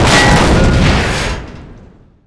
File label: stug destroy; WAR